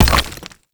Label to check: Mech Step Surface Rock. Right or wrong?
Right